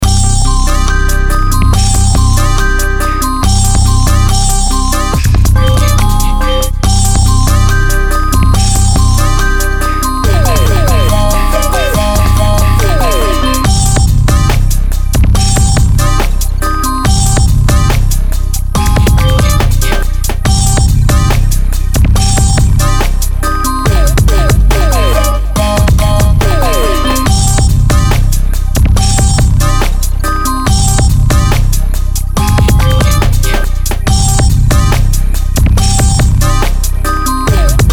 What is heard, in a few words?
Bone harmony beat